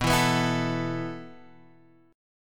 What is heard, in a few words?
B 7th Suspended 4th B down Guitar Half Step Down; B7sus4